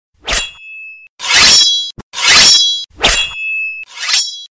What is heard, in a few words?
sword tone; games; ringtones